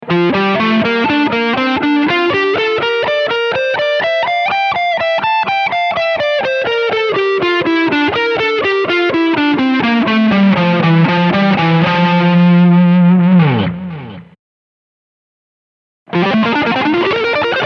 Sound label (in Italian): Fa Semicrome